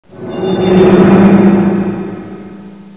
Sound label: creak